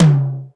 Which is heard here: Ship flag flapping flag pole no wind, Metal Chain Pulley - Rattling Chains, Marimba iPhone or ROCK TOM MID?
ROCK TOM MID